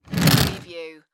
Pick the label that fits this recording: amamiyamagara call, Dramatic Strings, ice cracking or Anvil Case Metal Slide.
Anvil Case Metal Slide